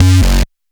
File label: F#, Dubstep Bass Hits; Oneshots; Octane; Serious Dubstep